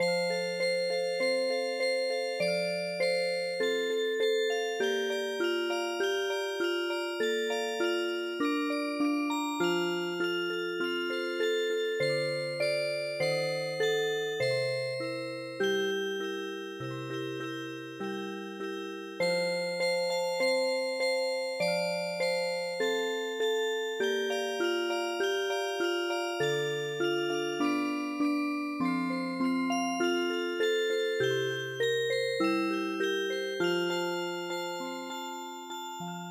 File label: Music Box, midi